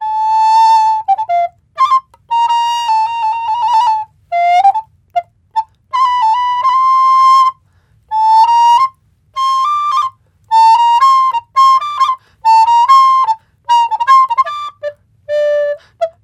Yamaha YRA402 B Ecodear Alto

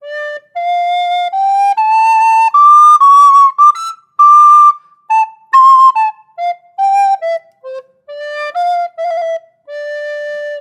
Aulos 205A baroque

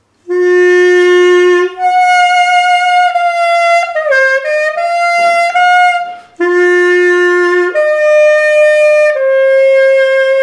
Mini pocket saxophone Sound

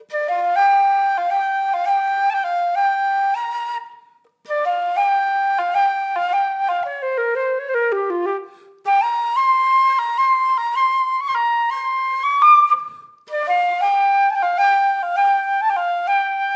Yamaha YFL212 Flute; FluteShop

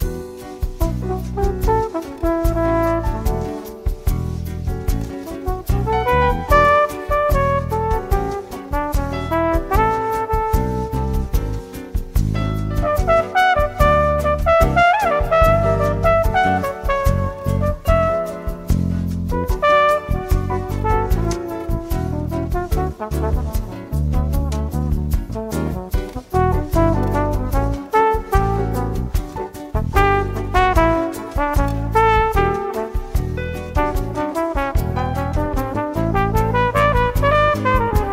Flugelhorn sound

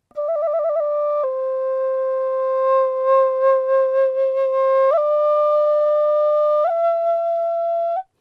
Dizi Sound C key

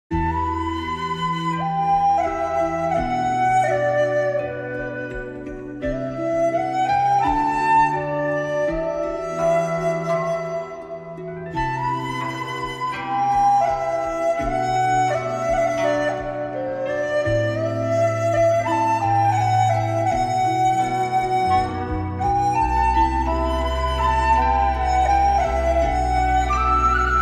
dizi flute sound sample

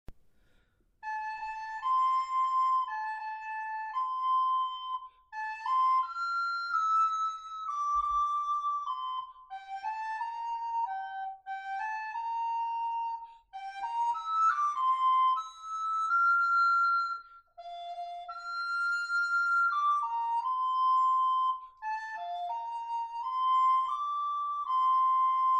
Sopran 2106 canta

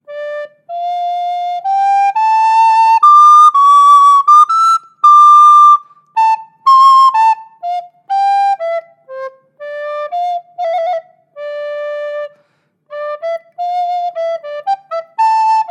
Yamaha YRS24 B Soprano Recorder; FluteShop

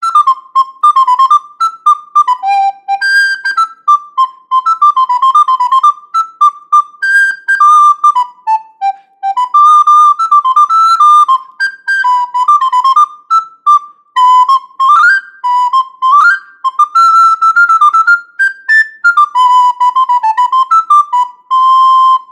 Yamaha YRS23 Soprano Recorder; FluteShop

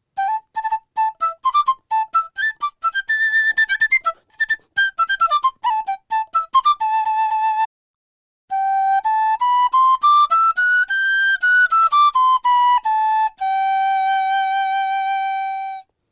Dizi Sound G key

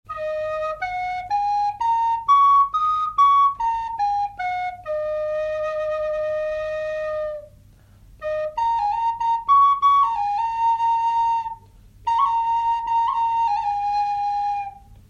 lakota sound

